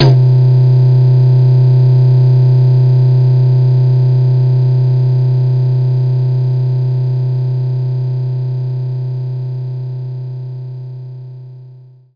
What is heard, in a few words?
tom